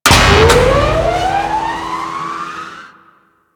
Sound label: Grineer Elevator Start; Warframe; Doors; Generic Elevator